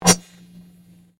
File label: Convolution Surge